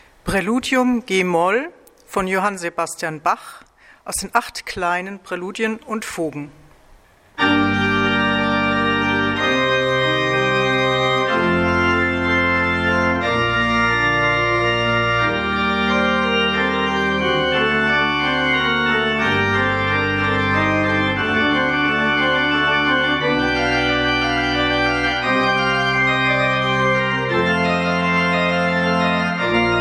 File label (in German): bach kleine praeludium g moll; jsbach